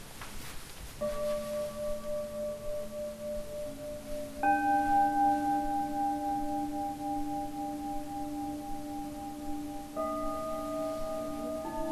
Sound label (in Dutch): Klankbuizen; ganesh muziek workshop